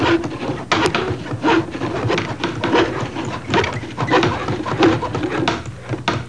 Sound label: Wood work; How Would You Survive (1995); Grolier